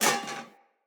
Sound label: Foley Armour Step H; Enemy; Mockeries